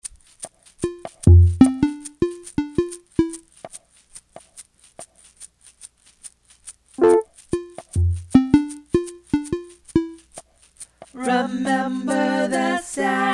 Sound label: waves, synth chill